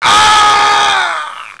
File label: panic; Messiah